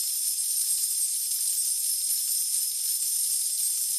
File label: supermegawin coins; aviamaster; Aviamasters